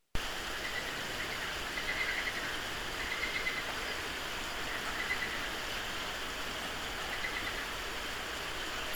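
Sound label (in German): Froesche bei der Traun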